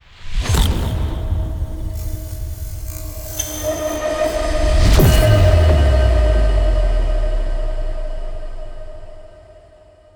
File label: Void Portal; Warframe; Cinematics; Apostasy